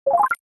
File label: Digital futuristic alert